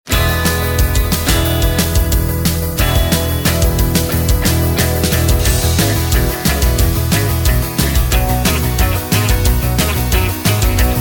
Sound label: (E) No Backing Vocals